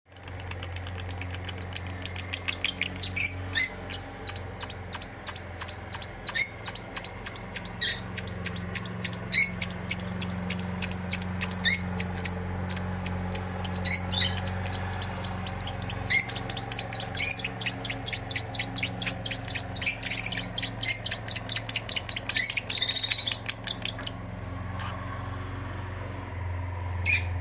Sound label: Agapornis taranta